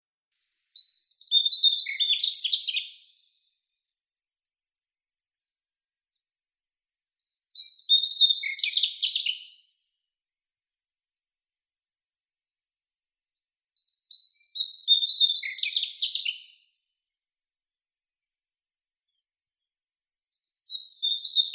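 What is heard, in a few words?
mugimaki flycatcher Ficedula mugimaki, nature sounds; muholovka taezhnaya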